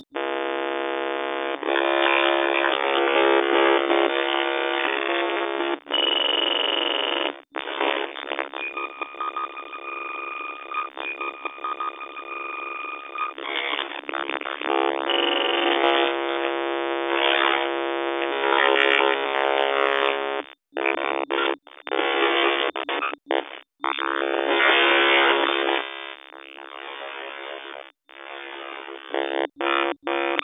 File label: Glitchy Tones